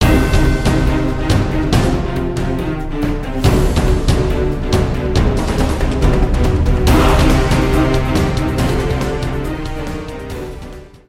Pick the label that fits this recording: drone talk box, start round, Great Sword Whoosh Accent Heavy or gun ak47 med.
start round